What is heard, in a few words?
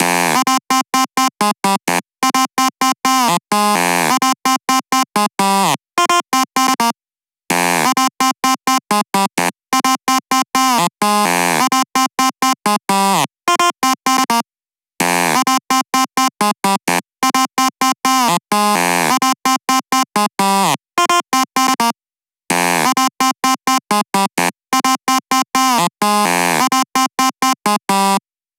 128 BPM lead Synth Add Dry noSC, G; Vengeance; Essential Clubsounds Vol.5; Demo Constr Kits; Sidewalk Kit